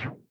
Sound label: Reel Bounce Snd; starburstxxxtreme